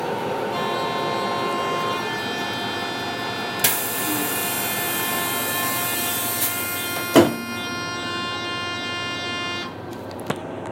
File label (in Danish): mf afgang; MF Afgangslyd #2; 26. juni 2018, Svebølle St.; Mads Liisberg